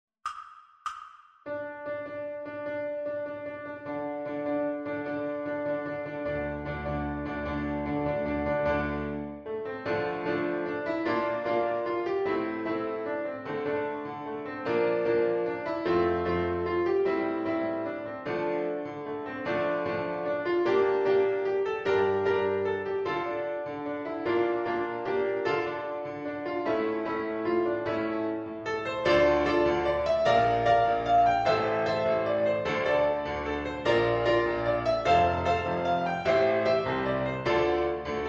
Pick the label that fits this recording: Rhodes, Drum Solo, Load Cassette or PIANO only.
PIANO only